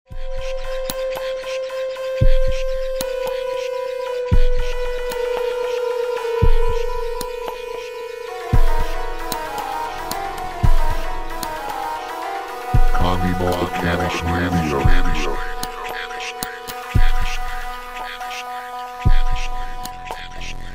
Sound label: jingle; kongkong